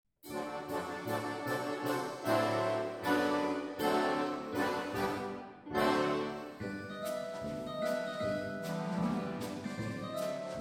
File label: Zither Ballade; Konzert2000